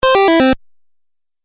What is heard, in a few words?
Beeps Video Game